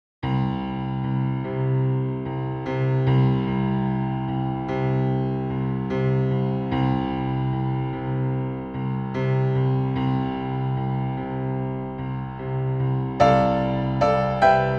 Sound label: piano force